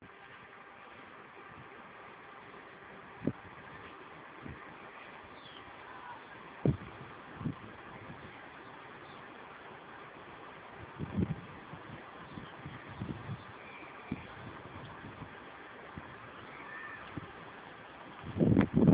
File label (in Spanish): Pajaros en el río; Lineal